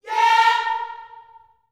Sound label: "yeah" A#4, Gospel; Spectrasonics; Vocal Planet Vol.1 Gospel; akai